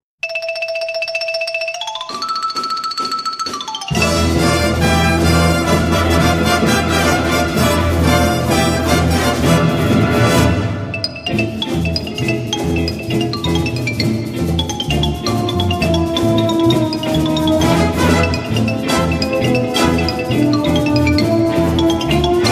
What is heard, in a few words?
xylo latina